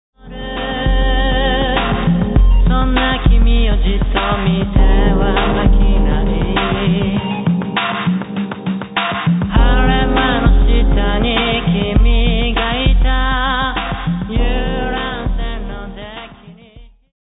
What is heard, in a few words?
triangle